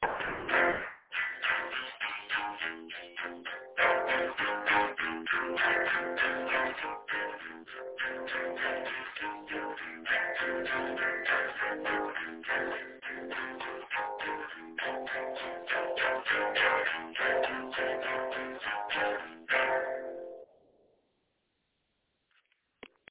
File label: minor chuga chuga